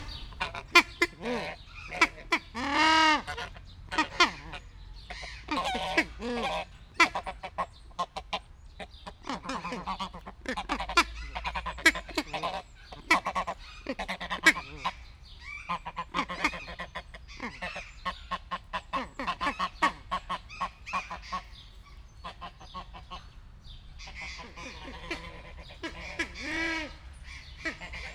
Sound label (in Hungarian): jobbra elmennek, magellán lúd; debreceni zoo 2019